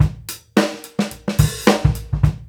groove, Grooves 99-132bpm

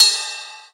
Ride Cymbal

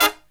HIGH HIT, Brass Riffs; USB Soundscan vol.29 - Killer Brass Riffs; AKAI; HIGHHITS

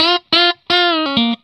RIFF 120, Vintage Blues Guitar; RIFF2 120; AKAI; USB Soundscan vol.22

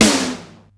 SDS1, simmons

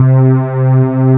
SAW; Pro-Rec - Synthsonic

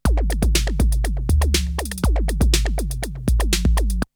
Drumloop; Drumloops; ZERO G - Datafile DISC 2

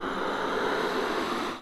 HOHNER BALG, akkordeon; Best Service; Alpine Volksmusik

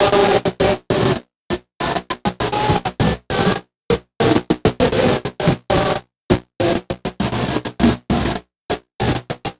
Envelope Beat; Drums & Drones

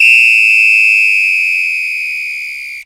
WHISTLE HIGH, BRAZIL

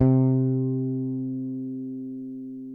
FRETLESS C3, e-bass

